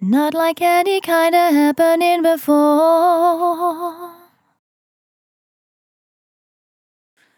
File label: On A Star 65bpm F, Vocal; Zero-G